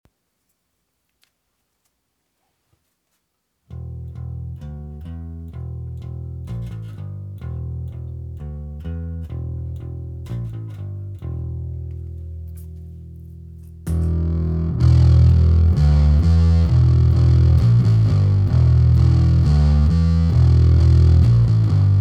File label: Deluge P Pickup